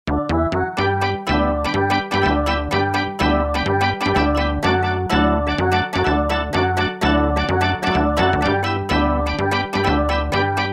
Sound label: Riff Organ; Roland M-DC1